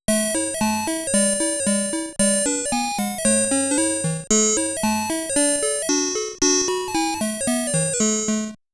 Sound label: High Score Music